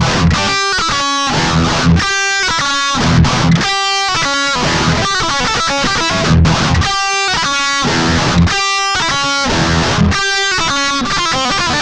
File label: Guitar Licks